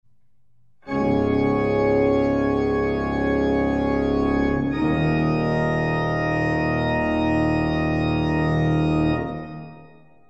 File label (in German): Fantasie ueber Luthers Agnus Dei fuer Orgel